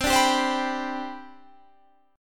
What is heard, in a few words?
C Major 7th Suspended 2nd, C, down, Guitar, Half Step Down